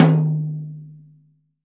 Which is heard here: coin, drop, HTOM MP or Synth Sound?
HTOM MP